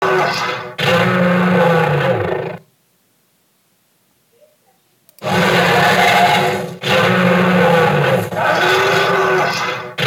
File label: wife snoring